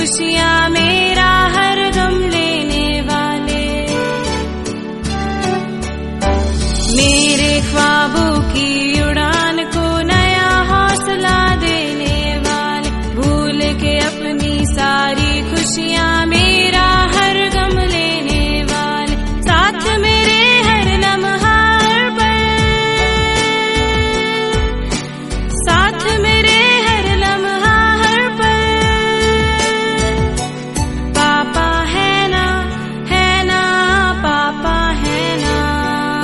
happy birthday papa from daughter in hindi

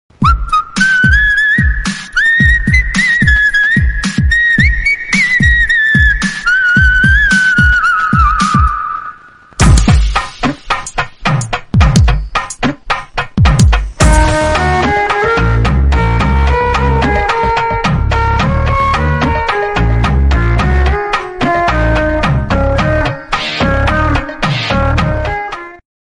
bgm; valli

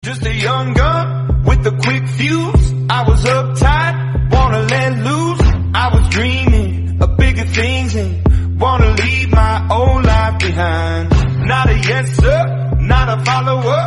thunder 8 bit

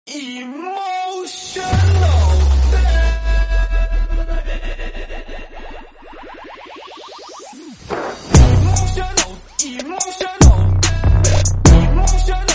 emotional damage remix trap

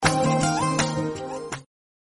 sms tone

